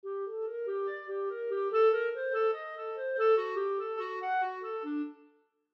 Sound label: Clarinet; examples in treble clef